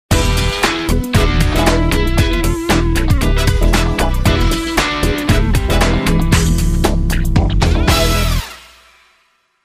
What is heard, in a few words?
baby jingle